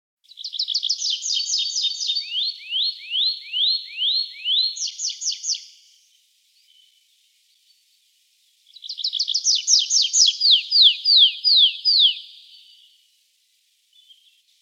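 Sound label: tree pipit voice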